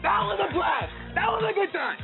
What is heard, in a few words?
blast